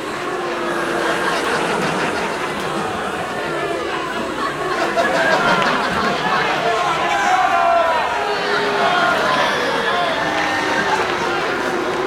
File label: teenage Crowd Jeer Loop